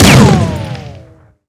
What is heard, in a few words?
sniper fire; weapons